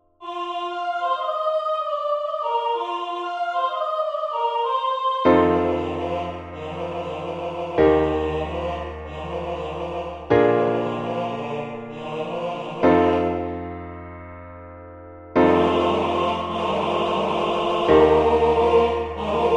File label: MIDI SATB